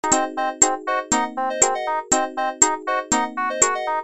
main Music; arpg

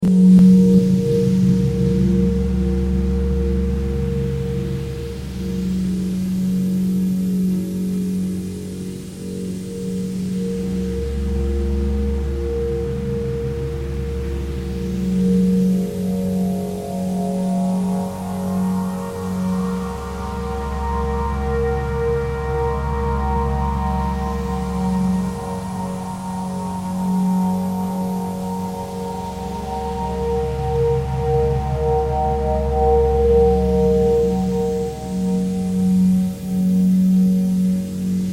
atmosphere waves; atmosphere waves.mp3

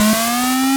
block change; jokingyou

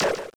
shoot; jokingyou

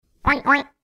monster; petparty

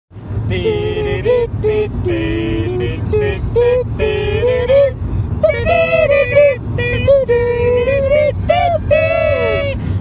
acapella

